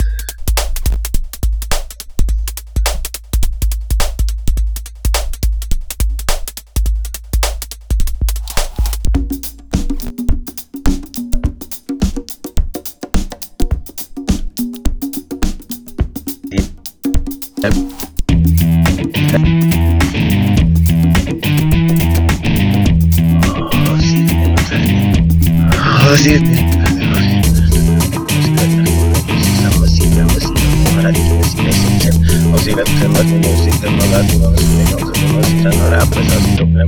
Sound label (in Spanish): mezcal style